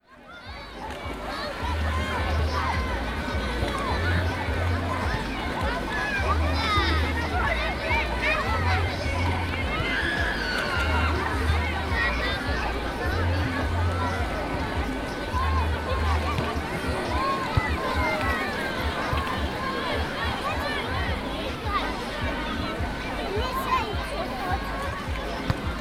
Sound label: lesnoe ozero; sergiyev posad